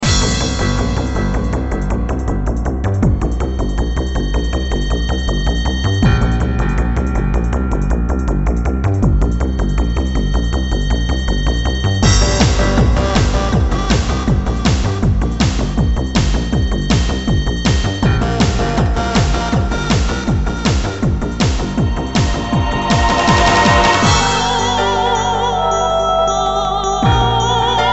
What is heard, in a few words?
Female Boss Theme